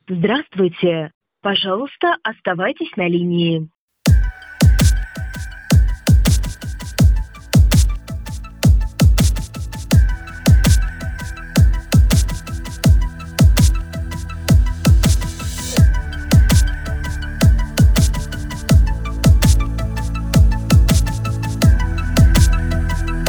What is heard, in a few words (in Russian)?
музыкальный файл